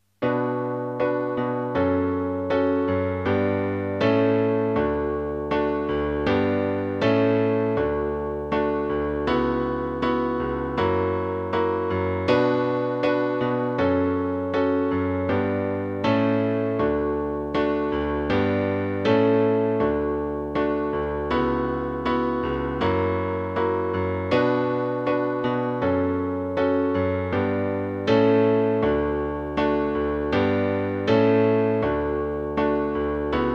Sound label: sad story, string orchestra; Inrescendo; McAllen String Academy